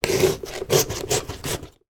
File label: pumpkin cut knife halloween; foley; sound effects; sound effects one